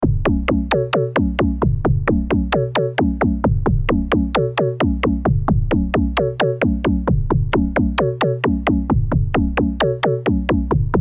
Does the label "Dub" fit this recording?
Yes